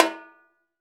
Timbale hi, Perc; Akai MPC500; LA Perc

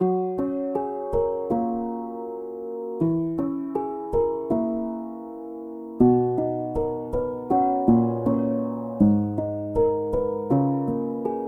reso Piano

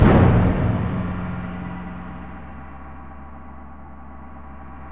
PRC GRONG, Orch Bs Drum

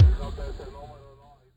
BD urban, Electric Kick, Yamaha DTXpress; drum machine

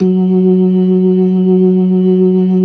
LO MAN V L, ORGAN LOWER MANUAL

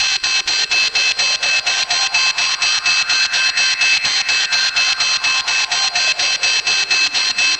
MACHINES

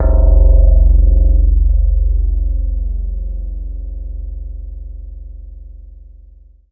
STONE C2; Greytsounds; Scott Peers Sound Engineering

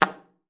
D#3, alto sax; Xsample VOL-2

